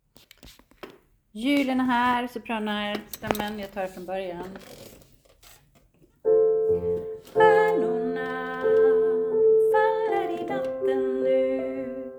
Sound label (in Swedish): överstämma, seniorkor; korsang